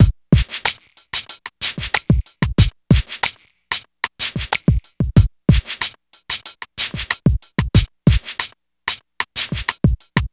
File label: E Coast HipHop Drums